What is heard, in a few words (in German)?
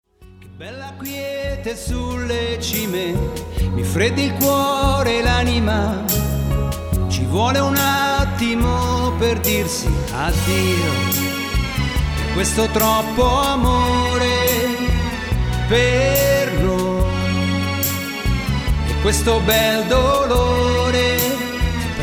Synchron Gesang